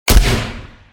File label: gunshot; tridroidznnpc